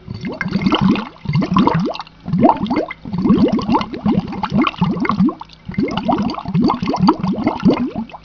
bubbles

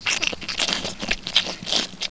gorilla eat; valve

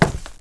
pl wood, player; valve

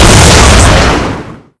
gauss

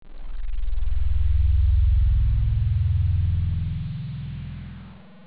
scary; valve

